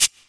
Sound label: Shaker; Equipped Music; Loungin House